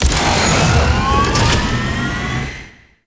Railjack Helix Missile Charge; Warframe

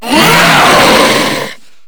Angry; Enemies; Vocals; Warframe; Grineer Hammer Boss

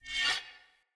Sphere Polearm Whoosh Accent; Weapons; Player

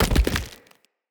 Mockery Body Fall; Enemy; Foley; Mockeries; Characters

